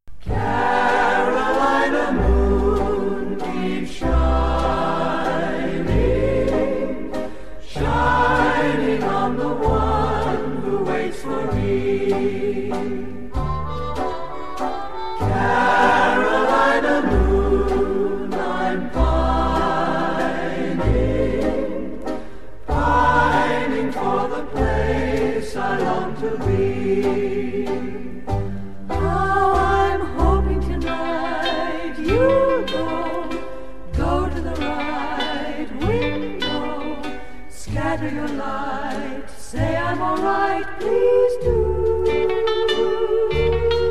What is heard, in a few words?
banjo band